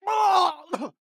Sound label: Scream; Lúcio; overwatch